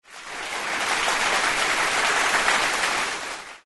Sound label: Applause; suoniFX